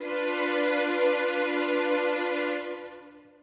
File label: Strings B2